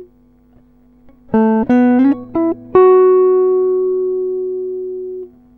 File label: Guitar loop 86bpm A; guitar vibes